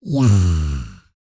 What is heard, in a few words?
Vocal, R&B & Dance; ILIO; Vocal Planet VOL-4